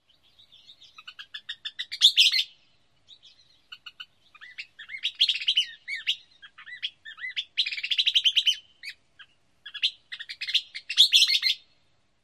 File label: dawn song without burst